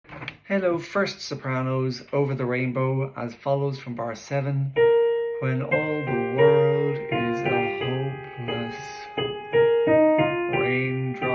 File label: sop